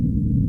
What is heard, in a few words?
SFX - Deep Hum Loop